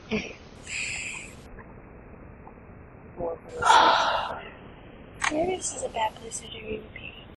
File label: AHHH; air museum